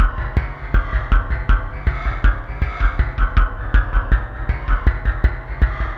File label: RISK LP, Industrial Loops